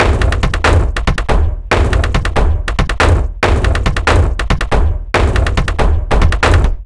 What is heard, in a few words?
Orchestral Drumloop; percussion and foley